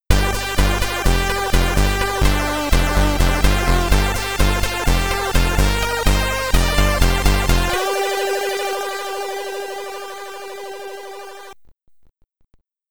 Get Ready! (SID Stereo); Baby of Can Guru; commodore 64

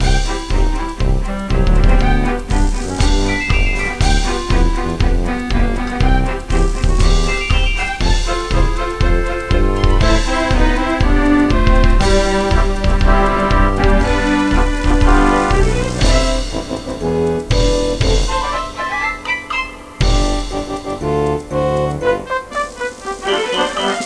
Fanfare; sesame street art workshop pc gamerip

